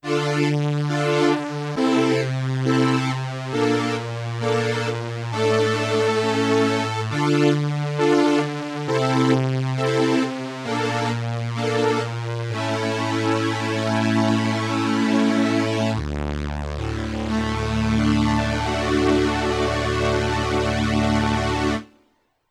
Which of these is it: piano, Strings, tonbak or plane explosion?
Strings